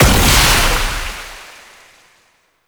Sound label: Wrist Gun Alt Impact; Weapons; Warframe; Tenno